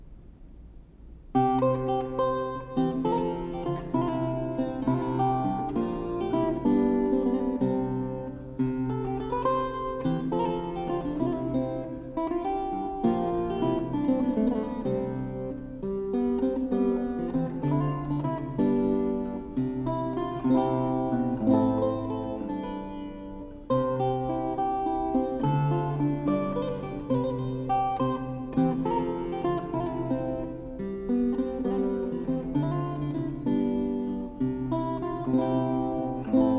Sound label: for lute; Mrs Winters Jump; John Dowland